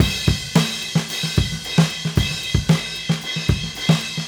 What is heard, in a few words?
Raw Funk Drums Crash; Sonic Foundry (Sony Creative Software); What it Is! 70s Analog Funk